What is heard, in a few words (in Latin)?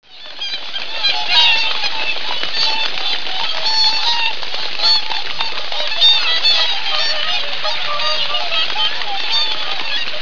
cygnus cygnus